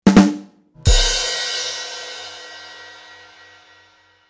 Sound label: Ba dum tss comedy funny punchline; cartoon